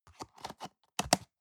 plastic box food small close soft

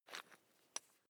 electric drill bit fasten wrench turn medium

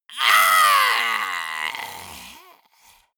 monster die high

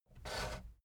fader plastic scrape single up halfway medium

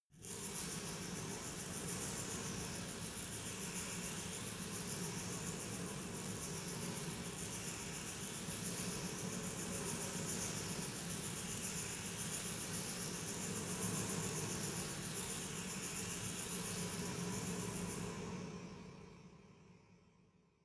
resonator rattle slow soft

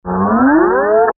servo action style

